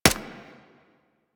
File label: Arch Long Rifle Mech, Weapons; Warframe; Archwing